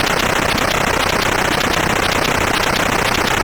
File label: collapse, attack sound; カタナ１